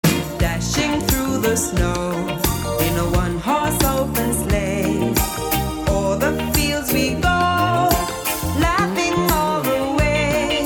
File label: Jingle Bells